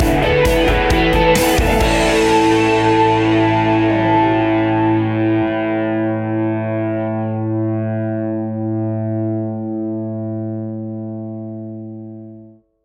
business indie rock stinger

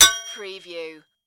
Sword Strike; Action, Impacts & Explosions

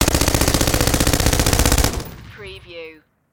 Machine Gun HK416

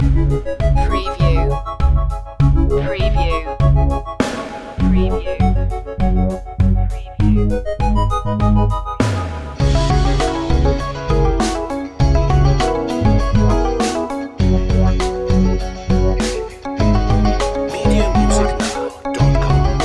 urban beat

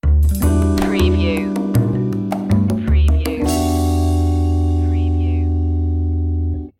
jazzy opener stinger

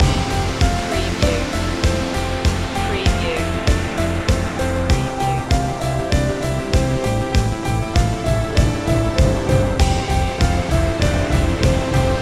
scandinavian light loop

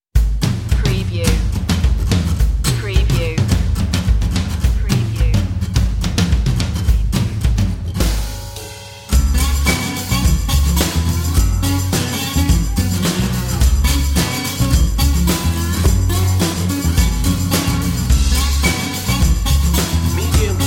southern groove

